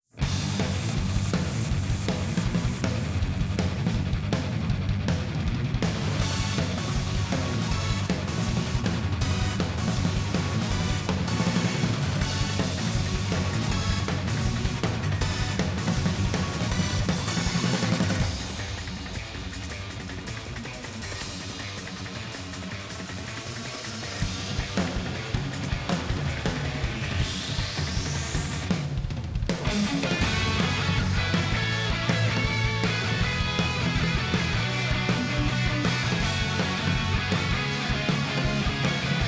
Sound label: Battle Boss General